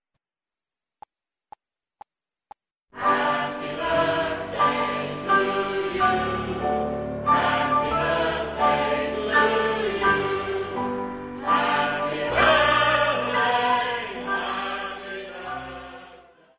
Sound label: Party Song Happy Birthday G, backing track